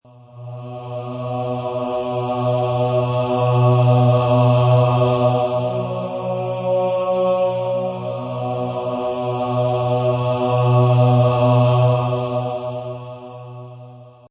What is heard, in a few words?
Loop Horror Choir Bm, Horror choir loop in B minor at 135 bpm.; Choir Loops